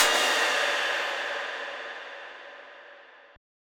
Hit

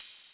Sizzle Open Hat; Open Hats

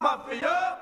Chant

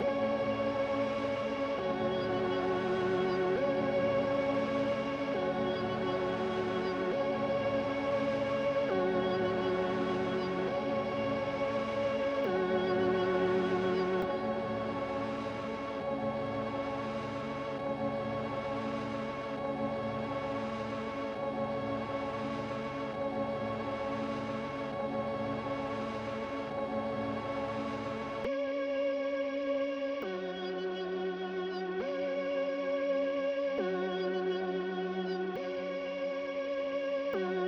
BPM 135

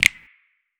DrumMix; DrumMix (78)

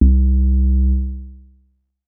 Ambient 808; 808s

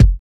Kicks

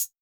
Closed Hats; Southern Smoke V3

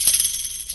Perc; Daz Hard

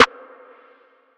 snare one shot verb; Snares

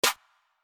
Clap

